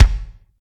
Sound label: wet kick sound f key; kick drum samples